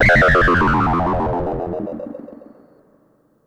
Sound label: Ring mod FX